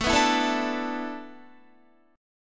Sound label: A Suspended 2nd Suspended 4th, Guitar; Asus2sus4; strummed